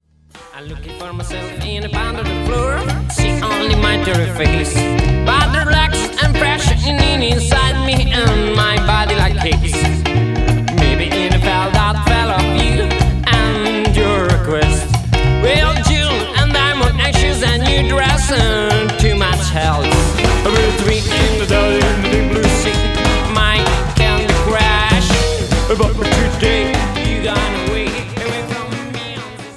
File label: Stomp